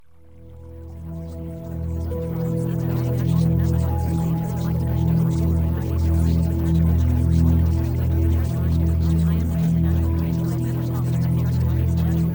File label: Music